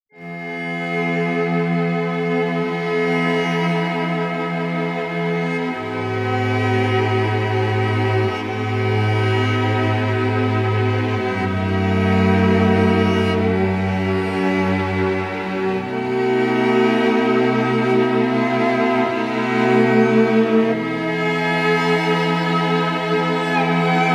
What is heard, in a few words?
Daddy Strings